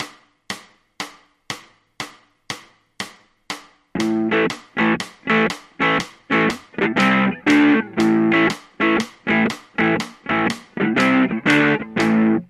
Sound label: blues, ritmos de blues